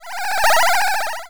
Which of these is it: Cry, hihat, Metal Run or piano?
Cry